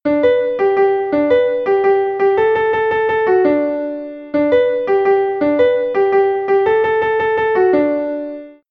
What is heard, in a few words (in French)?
refrain piano